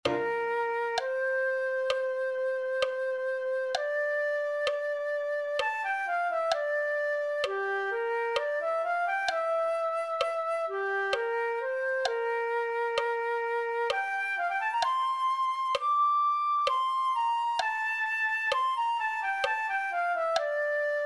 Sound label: flute